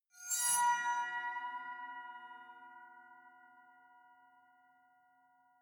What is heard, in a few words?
aquaphone fast